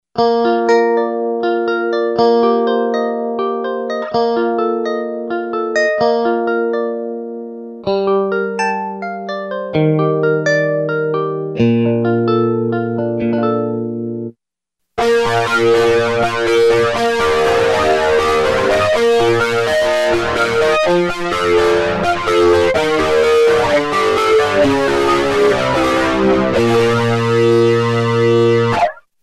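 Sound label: distortion + flange, Yamaha REX50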